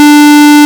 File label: OB SQ, Synths; Multisamples; Ableton Live 12 Lite; Core Library